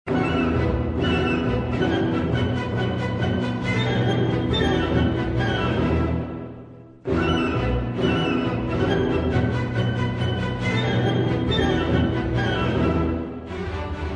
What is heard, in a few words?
classical ringtone; der troubadour